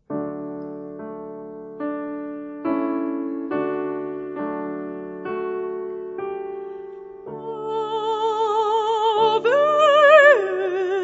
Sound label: Ave Verum; Mozart; repertorio para bodas